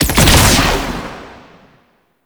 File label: Drop Beam End; Enemies; Weapons; Warframe; Tau; Sentient Dactolyst